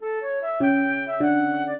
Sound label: minuet, flute harp; Mozart